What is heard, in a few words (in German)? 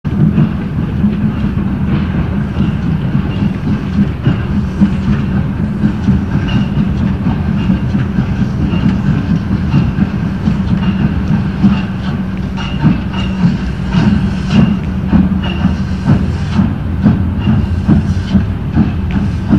Kurzanfahrt; alsdorf